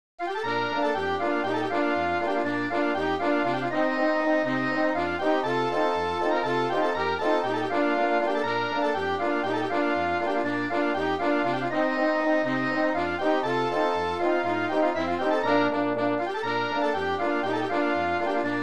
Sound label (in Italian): fanfara polka